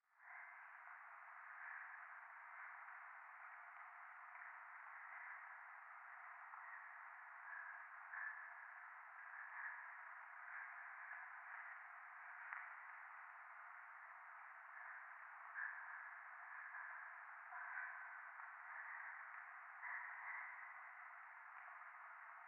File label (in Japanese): 右耳快感吐息 雨; 廃墟